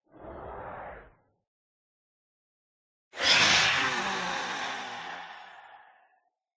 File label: swoop, phantom; mob; Minecraft